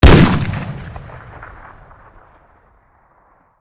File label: fire; black ops 6; iw9; weap india fire npc